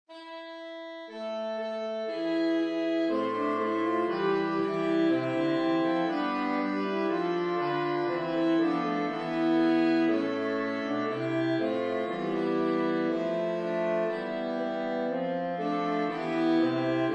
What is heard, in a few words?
Sax Quartet; Dans Le Serain; Fugue